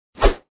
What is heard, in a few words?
battle announce; Monster Arena